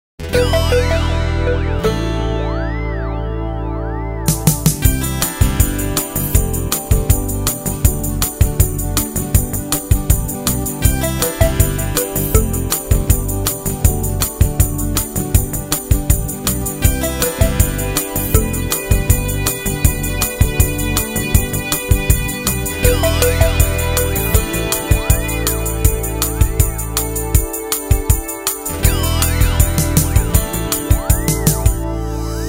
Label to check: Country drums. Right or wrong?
Right